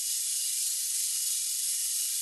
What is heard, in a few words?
laser, hit